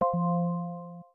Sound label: Light Touch, notifications Calm; Samsung Galaxy S26 Ultra